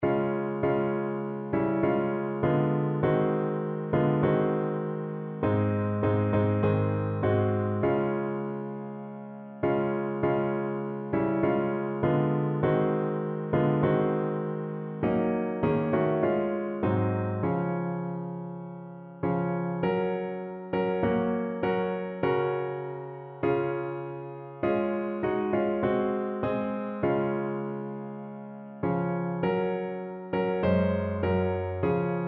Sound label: piano